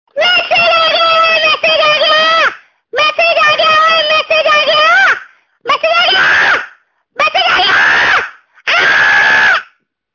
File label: Mobile tone